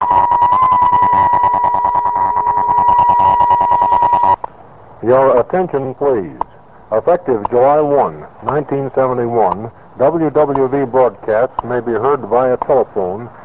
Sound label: wwv phone